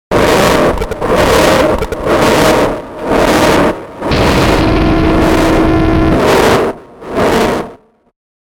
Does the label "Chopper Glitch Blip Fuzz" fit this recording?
Yes